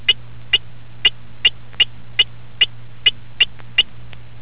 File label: argus reed frog